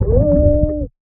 underwater scream male dark; soundscrate

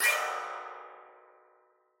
Evil High Scrape; SoundsCrate

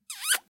kiss; soundscrate

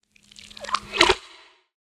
water swell; soundscrate

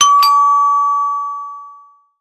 doorbell; SoundsCrate

